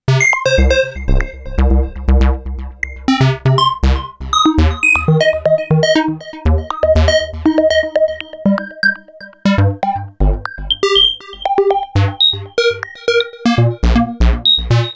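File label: KORG Odds Stochastic Virtual Analog; Stochastc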